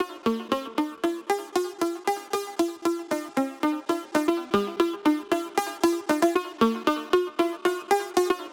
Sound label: synth; Pony Fan Music Remix Stems